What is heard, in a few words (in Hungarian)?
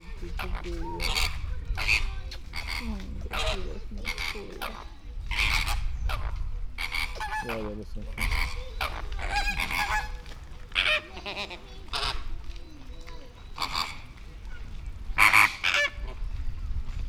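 mindkettő, rózsás flamingó chilei flamingó; allathangok; veszpremizoo 2013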